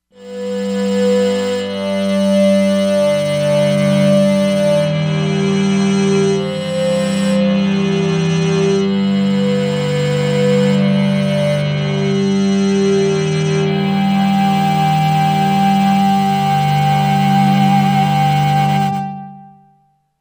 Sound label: Esraj, Korg MSC-13S Ethnic